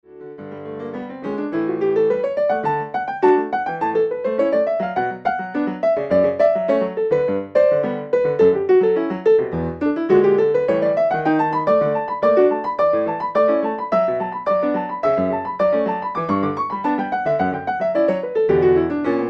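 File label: Canon in D Major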